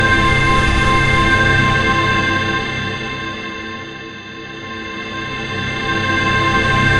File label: loup; brainpipe